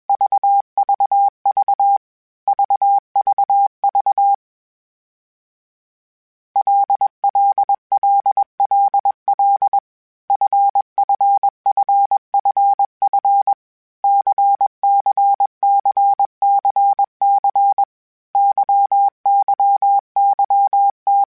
LFCYQ, Morse Code; HamRadio; Basic Sets

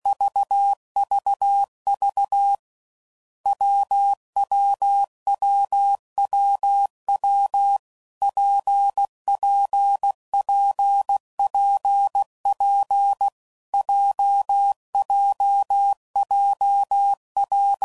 CW Code Groups 15WPM, Difficult Combos; HamRadio; Morse Code; Mixed; Combinations

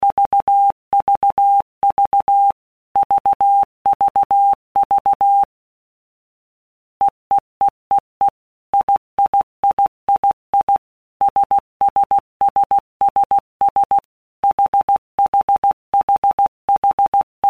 All Groups 15, CW Code Groups; Basic Letter Groups; HamRadio; Morse Code; Basic Sets

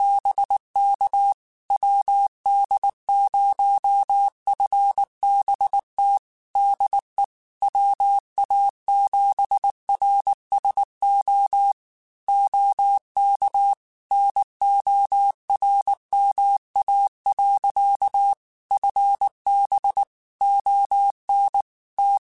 Morse Code, QSOs

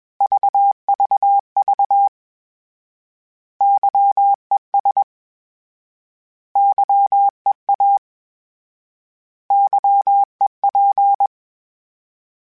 Morse Code, CW Code Groups; HamRadio

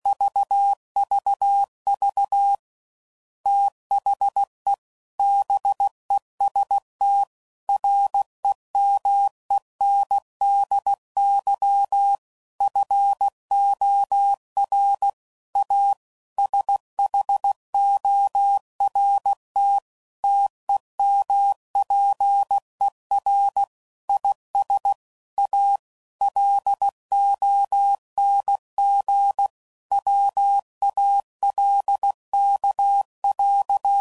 CW Code Groups 15WPM; Sayings; Quotes

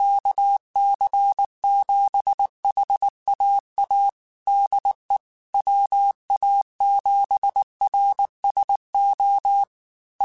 Morse Code, QSOs

